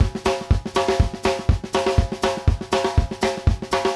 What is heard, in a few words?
TRAIN BE, TRAIN 120; Sampleheads; New York City Drumworks VOL-1